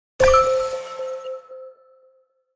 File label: Pointer; DailyBonus